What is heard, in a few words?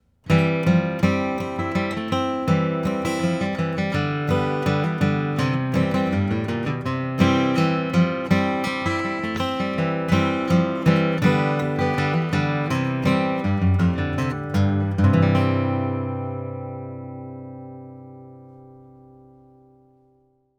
ACC GUITAR TUBE STEREO